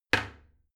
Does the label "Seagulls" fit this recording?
No